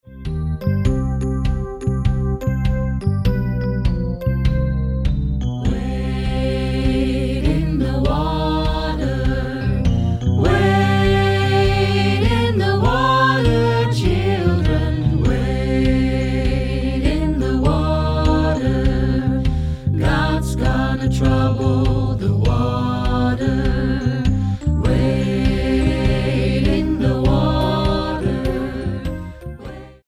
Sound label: Gospel Choir; Wade In The Water